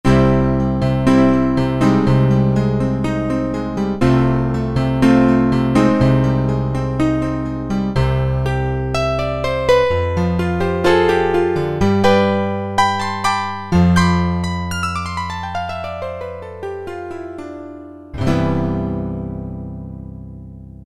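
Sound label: Acoustic Piano, Roland D-110; Factory Patches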